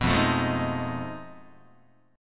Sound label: F 7th Sharp 9th Flat 5th F Guitar; strummed; chord; F7#9b5; JGuitar